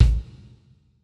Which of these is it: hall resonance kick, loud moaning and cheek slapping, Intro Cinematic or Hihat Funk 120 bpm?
hall resonance kick